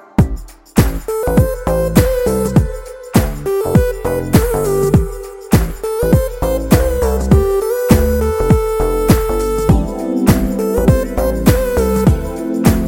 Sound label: Instrumental Tone